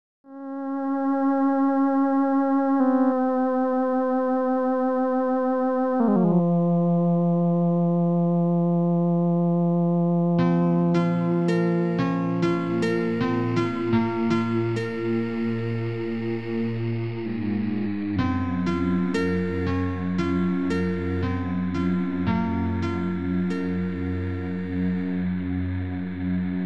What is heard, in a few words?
crystal rain; da capo vol1